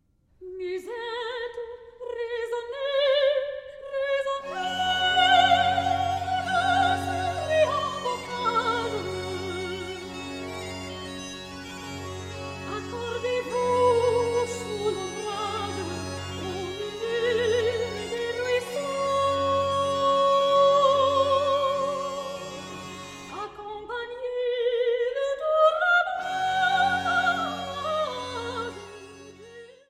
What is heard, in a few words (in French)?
Musettes Menuet En Rondeau; Rameau; Les Indes Galantes; Musettes Resonnez; rameau regne amour